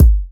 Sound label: Kick, Kickdrum; Equipped Music; Loungin House